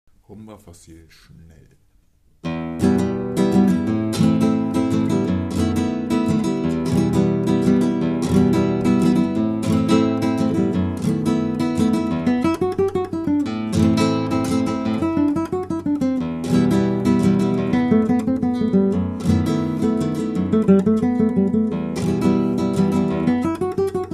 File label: rumba facil fast